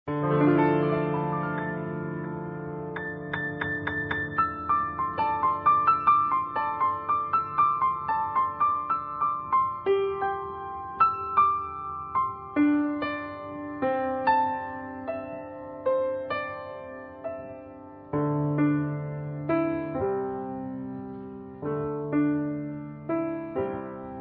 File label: piano